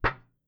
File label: metal box; Phasmophobia